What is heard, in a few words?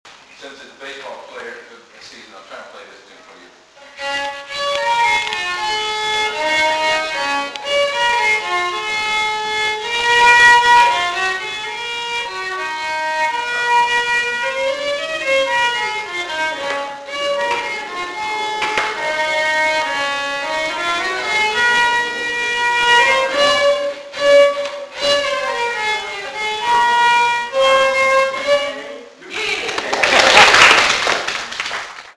violin ball game